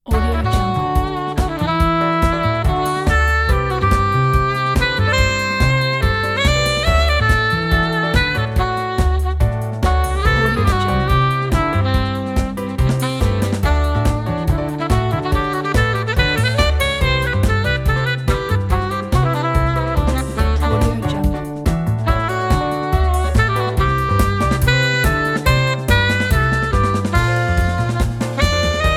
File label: acoustic jazz disco